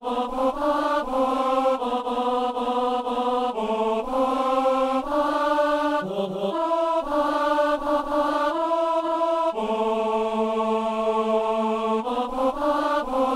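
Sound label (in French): ténor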